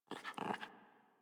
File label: Puppy Idle Pant Grunt; Warframe; Kubrow